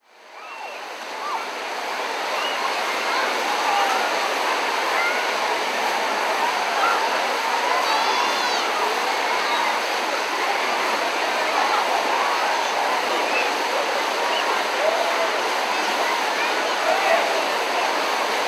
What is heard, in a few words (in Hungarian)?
gyermekek vihancolása a strandon